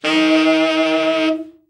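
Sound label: TENOR overblow, Sax, FALL; Giga Samples Collection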